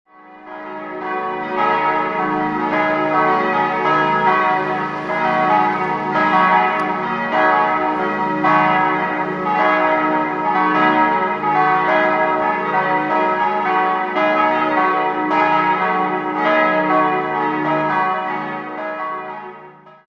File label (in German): Koeln Joseph, Glocken